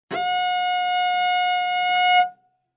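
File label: Cello arco fortissimo sul A F4; MIS Pitches - 2014; Strings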